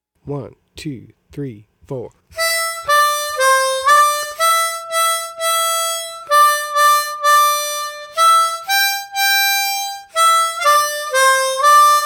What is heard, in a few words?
Mary Had A Little Lamb Fast